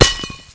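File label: grass; Mafie 1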